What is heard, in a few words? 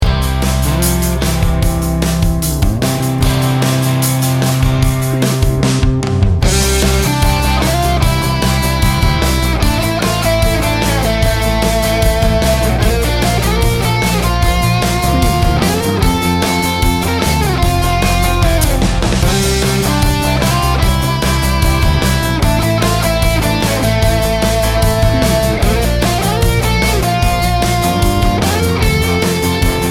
classic corporate rock